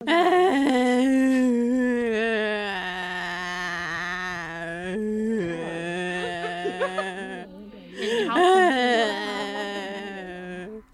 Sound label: cry